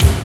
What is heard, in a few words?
BURN KICK; Drums In Your FACE!